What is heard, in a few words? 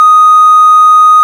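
ORG, Farfisa organ; Roland LCDP09 Keys of the 60s and 70s 1; Farfisa Clar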